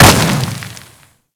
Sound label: Pulse Exp Impact Default; Warframe